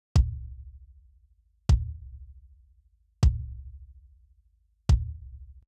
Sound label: BD COMP